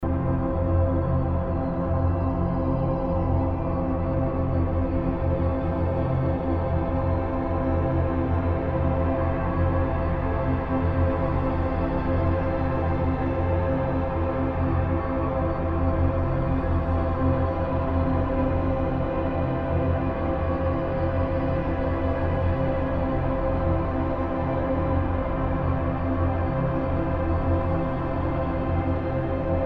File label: Amb Sound Scape Intense drones, Deep ambience; Ambient SoundScapes